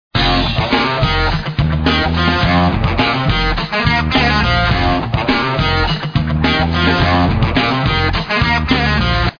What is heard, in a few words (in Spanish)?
gana; Ataque de Esporos